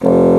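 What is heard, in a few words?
Contra Bassoon, F0; Digital Sound Factory Virtuoso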